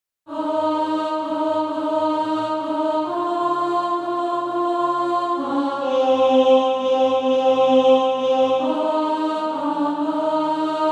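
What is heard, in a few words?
alto; Far and Near Clemm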